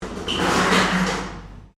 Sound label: FORKLIFT HAND TROLLEY COLLECTING PALLET STEREO, Hand operated fork lift trolley